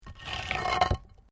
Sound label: PAVING STONE MOVE, dragging